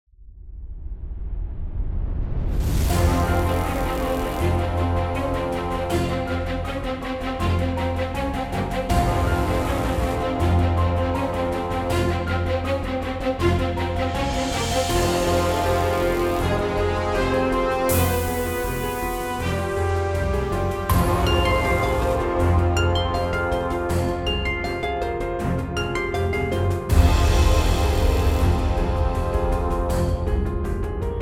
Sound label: BGM; hidden dragon legend